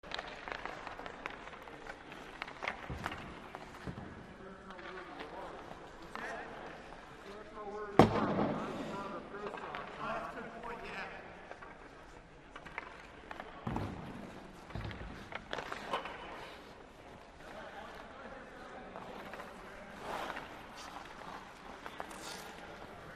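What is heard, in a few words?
Hockey Players Practice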